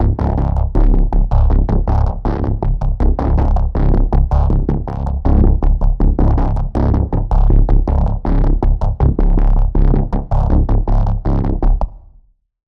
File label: Pulse; Imminent Explosive; Routed Sound; Vital Expansion - Quantum Enforcer Vol.1